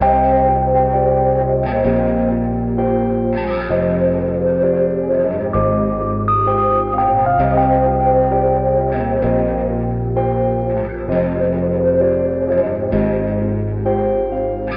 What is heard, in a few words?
monkey shines, loops electronic